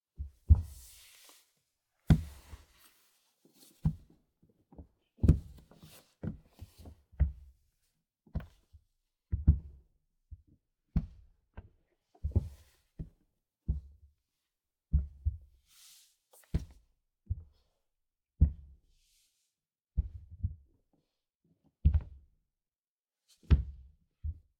Crawl Up; LOOPERS

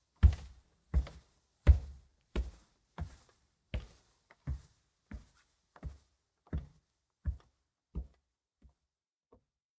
Footsteps Go Down Stairs; LOOPERS

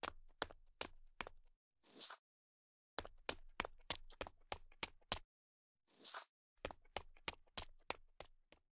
Run; LOOPERS

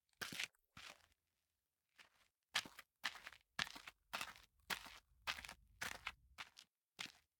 Look Back; LOOPERS

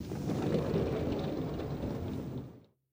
Open A Door Elevator; LOOPERS

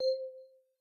system hit; LOOPERS

